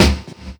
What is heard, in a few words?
phat steel snare drum sound D key; snare drum samples